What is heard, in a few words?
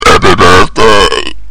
birthday, belch